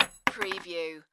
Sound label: Bullet Drop Rifle Shell